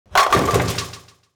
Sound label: bowling; Jam13Yuuka